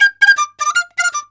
CHINA, Heart of Asia; Spectrasonics; CHINAFLT

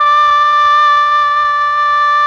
OBOE; ILIO; Propeller Island Legendary M400

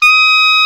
KLARINET, Alpine Volksmusik; Best Service

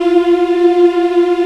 African Mm m; Roland LCDP11 Africa VOL-1

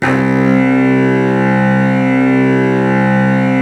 Virtuoso Strings, C1; ILIO; Kirk Hunter Virtuoso Strings VOL-2; 90 s Sample CDs

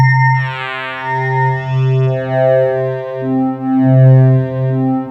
metal; Rubber Chicken Software Sample Bank

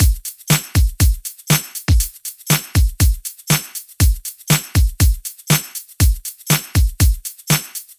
Drumloop, 120bpm; Construction Kits; Zero-G; Nu Disco; Nicengroovey C

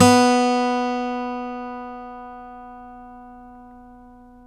STEEL F B2, GUITARS; Synclavier Sampler Library

